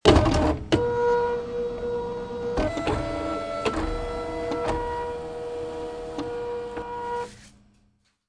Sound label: copier; adultswim; FiveMinutesKillYourself